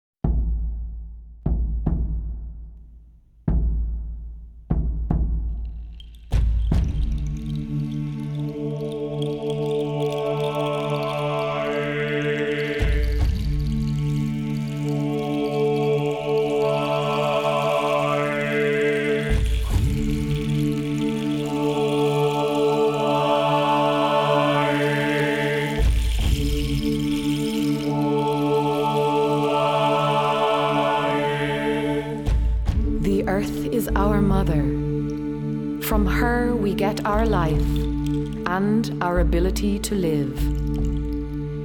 SATB Percussion Sprecher

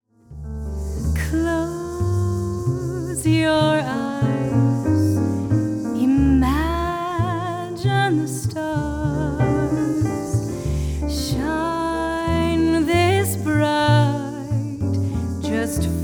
Lullaby

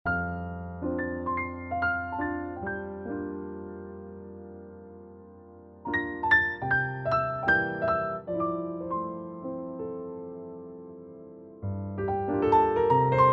latin Improv Prelude

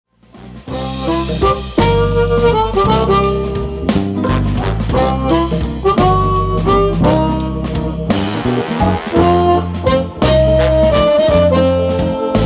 Waltz

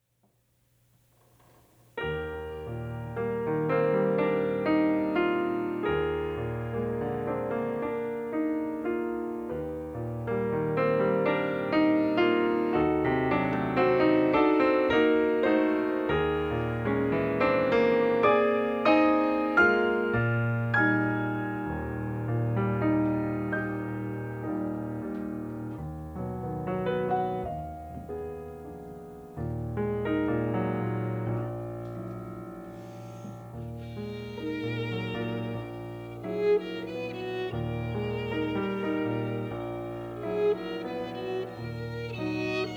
Waltzes; Antheil-Gebauer

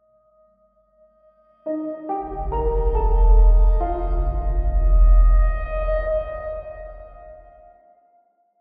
Sonic Logo

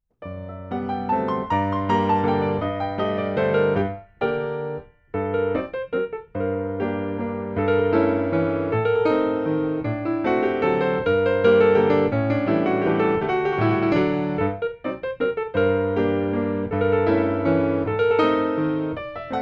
Pirouettes 3/4 (8X8) Spanish Waltz

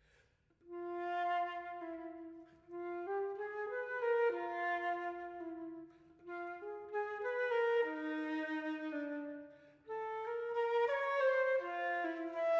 Solo Flute Sonata, Allegretto E Poco Rubato; Solo Flute Sonata No. 3

